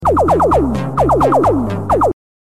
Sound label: italo disco